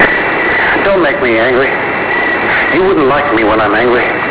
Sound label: angry